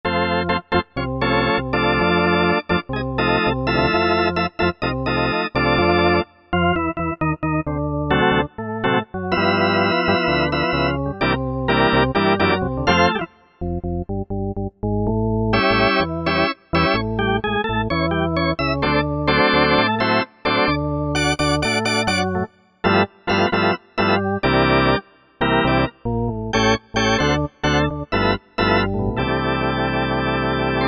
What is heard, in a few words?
Korg MSC-9S Organ, Full Trem